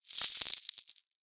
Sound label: bean sprinkle